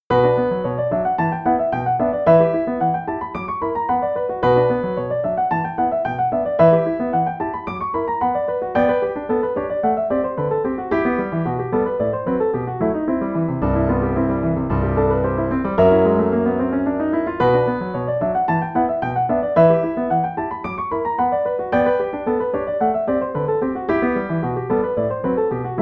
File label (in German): Klavier; Haschemann